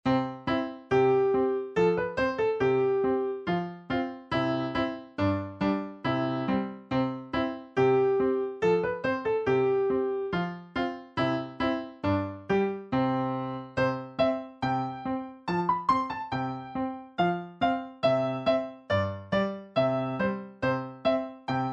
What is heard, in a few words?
la fourmi instr; French